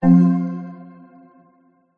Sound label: multimedia alert single note mallet synth exclamation; zapsplat